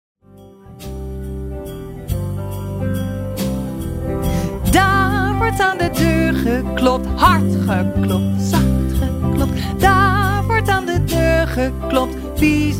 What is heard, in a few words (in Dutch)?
Zang